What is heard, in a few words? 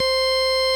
ORG VOX C, Continental